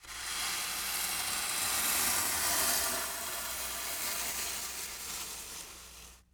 Industry Tool Shovel Sand Slide Shovel Across Sand